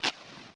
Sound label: Horror empty recycle bin